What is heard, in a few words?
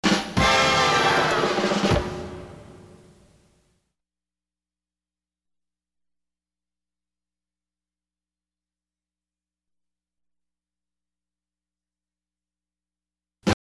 Drum Stops; Pams